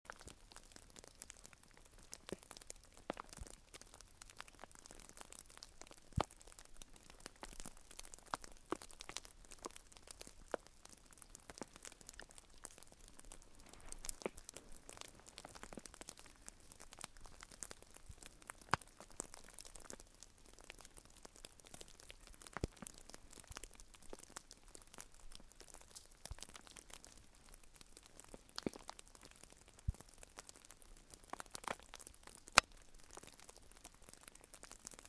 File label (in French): bruits nuages